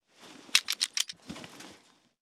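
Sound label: weapon missile launcher jam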